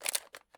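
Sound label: mp5 weapon bolt jam; Escape from Tarkov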